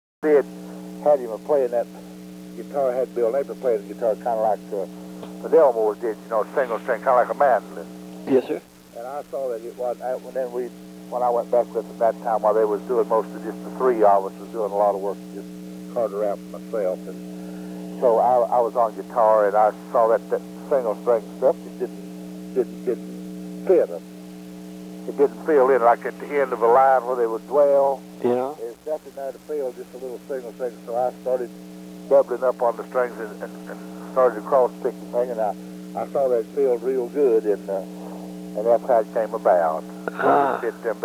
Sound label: plays guitar